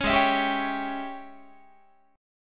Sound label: G sharp Major 7th Suspended 4th Sharp 5th, Guitar; G#M7sus4#5 Chord